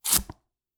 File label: Cutting Board Vegetable Chop; soundscrate